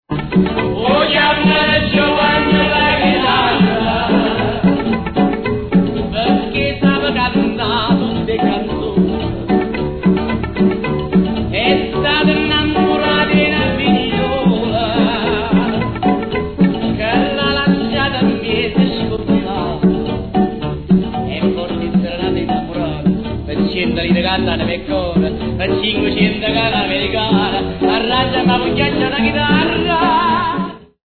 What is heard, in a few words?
QUARTET; Inciso il 1 Ottobre 1955